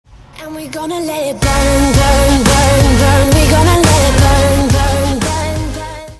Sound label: Burn Buzzer